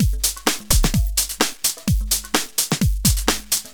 Houseloops 128BPM; Vengeance Samples

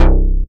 Bass C, Offbeat Bass

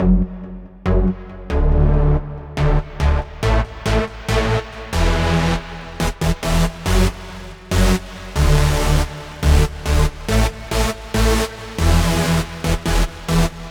Lead Low, 140 BPM; Vengeance Samples; Vocal-Hook Kits; Song Kit; Stop!

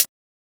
hat; Dubstep Sample Pack

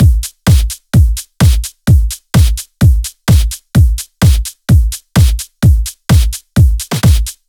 Housebeats 128BPM; Vengeance Samples

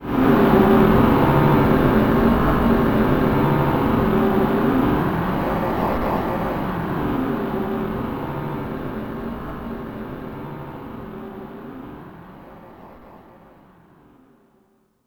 VFX Atmos

